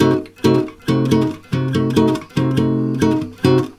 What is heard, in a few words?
C DIM GTR, Brazil Bossa; USB Soundscan; AKAI